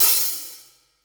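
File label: open hat, cymbal; drum; CYMBAL STUFF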